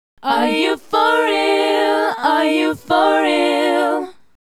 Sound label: Female Group, Hip-Hop and RnB Vocals